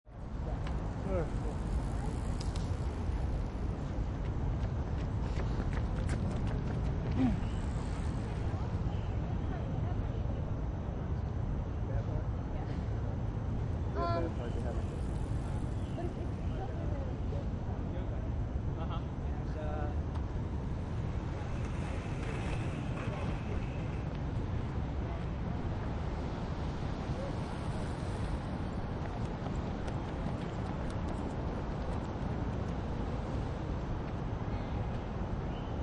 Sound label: Central Park Near Path, City Park - NYC, Central Park, early evening. Birds singing; very faint voices in background. General outdoor ambience; People